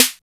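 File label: 808 CRACK SNARE; Drums In Your FACE!; NEWJACK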